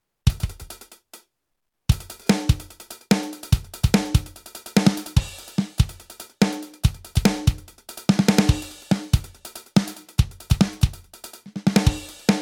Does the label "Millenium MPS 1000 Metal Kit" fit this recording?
Yes